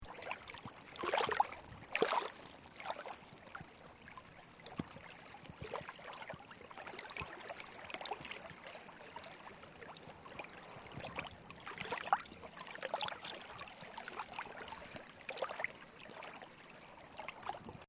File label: Quiet Lake Water Lapping Short; Gentle water splashing, lapping, like rowing boat, paddling on water, calm lake; Lake Sound Effects; Very small